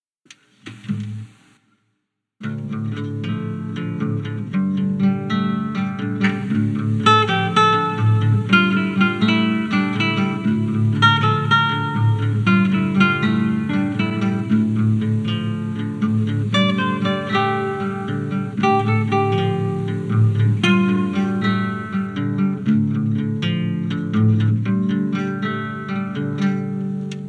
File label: acoustic interlude instrumental